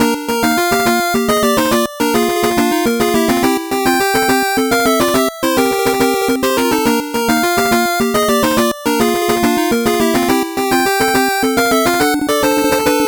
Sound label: 8bit bgm fast